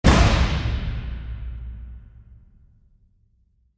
Revenge Shout; Gothic Storm Music

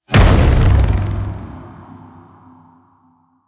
Clapper Hit Metal; Ninja Tracks

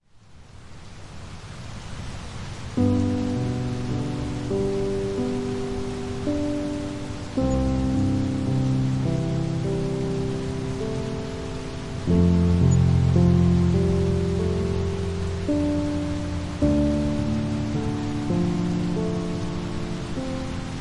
Calming Rain Song, Piano in Nature

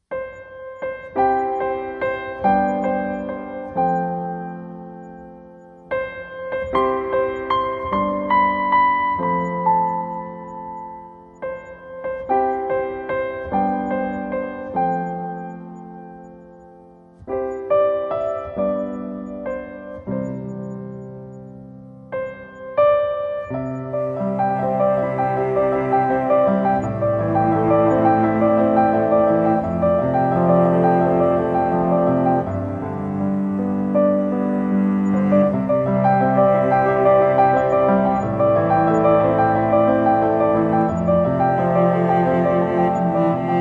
Piano and Cello

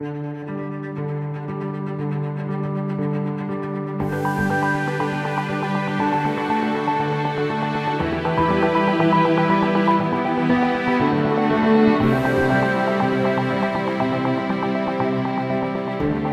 Ambient

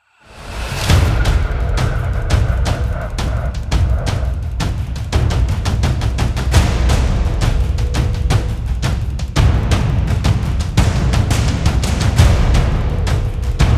Data Mining Percussive Outro Only; Ninja Tracks

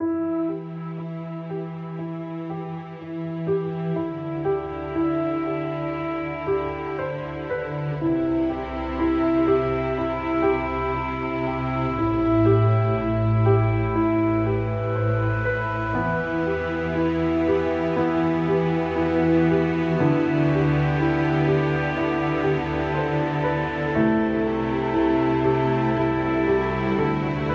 Overwhelming Ambient

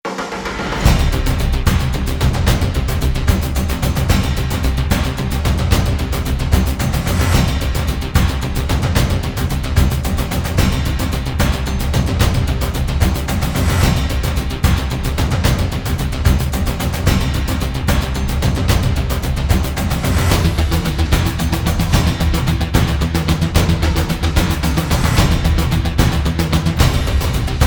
Drums Only Version

